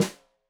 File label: Snare Stereo, Drums; Deeply Sampled Drums